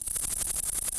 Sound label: song, katydids; neduba; diabolica